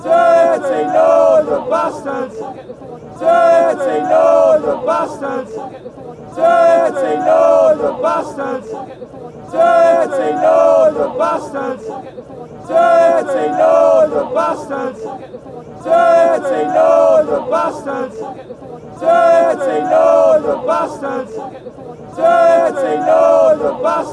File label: ya dirty northern bastards, fan chants